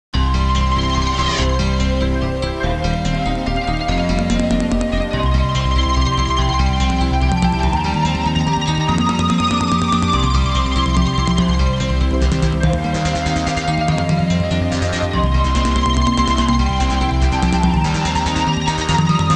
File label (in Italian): musica elettronica